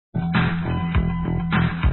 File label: asterick; Avalanche